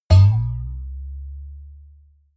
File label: boing